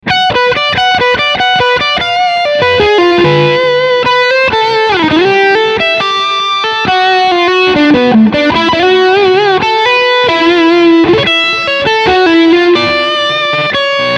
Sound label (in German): Leadsound Steg HB